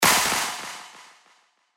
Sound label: Clap FX; TECHNO